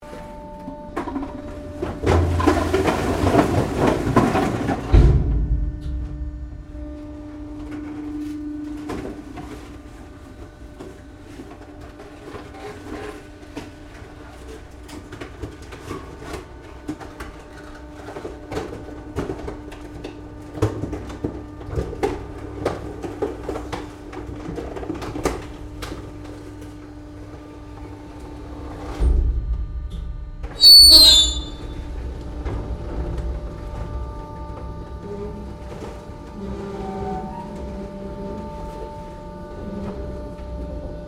Trash Compactor Operating Internal, Industrial trash compactor; Machinery; Machines